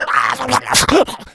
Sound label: shout, infected; npc, alert